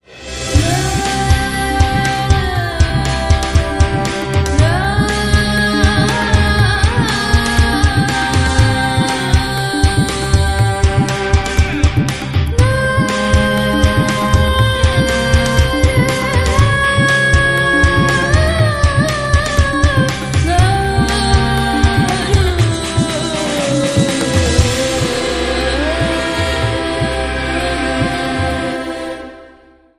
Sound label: Ethnic Rock Epic